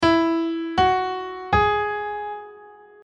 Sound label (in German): drm E dur